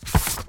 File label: scroll